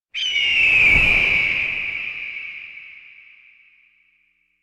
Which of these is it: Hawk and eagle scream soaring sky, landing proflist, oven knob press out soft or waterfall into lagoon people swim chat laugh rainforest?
Hawk and eagle scream soaring sky